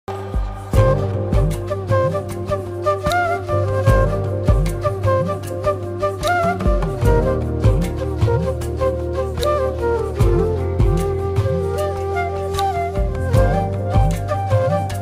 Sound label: onam song bgm